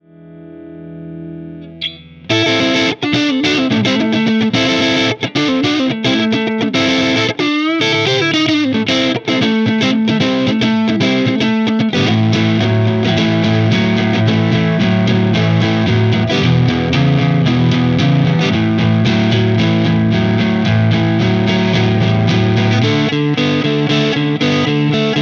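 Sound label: TC15 Channel 1 Hi Crunch Hi Tele Neck Mesa V30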